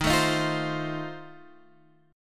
F 7th D sharp

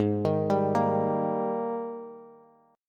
Ab Major 7th Suspended 2nd Ab Bass; AbM7sus2; 16,18,17,15; arpeggiated; chordshape; JGuitar

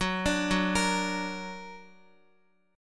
Gb Augmented Guitar; Gb+ arpeggiated

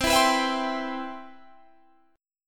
C Major 7th C down

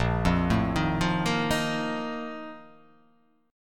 Bb Major 7th Suspended 4th, chord; arpeggiated; JGuitar

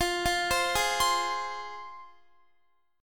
F Minor, down, Guitar; arpeggiated; 4F,4A,5C,5G,5C,6E

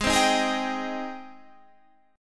Ab Major 7th Ab down Guitar Standard; AbM7 strummed; JGuitar

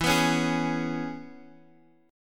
E 6th, down, Guitar, Drop D

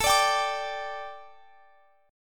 D Suspended 4th A

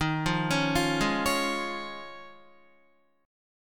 Eb 7th Suspended 2nd Suspended 4th Eb, Guitar; arpeggiated

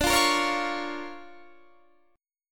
D Minor Major 7th Sharp 5th, D, Guitar, Standard; 22,20,20,18,18,21; DmM7#5 strummed; JGuitar

